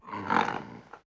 Goat idle

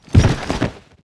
Red Condor die

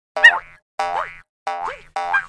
Monkey Action

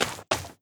Crane run; Seer; GamigoNA

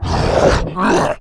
Goblin Captain Atk Skill; Seer; GamigoNA

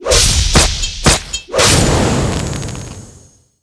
fatal blow

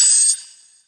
Mad Slug DMG; Seer; GamigoNA

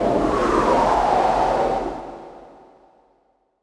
Wind run; EmuClient

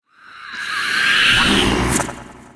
Rhinoce Lord die

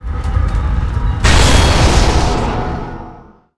Atk Skill

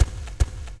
Boar Run

